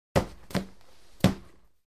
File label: Boxing Bag Hits